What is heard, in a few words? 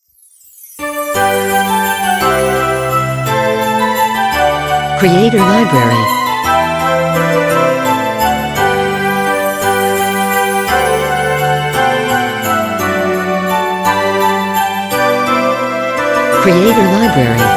Merry Christmas Ident; Logo-Idents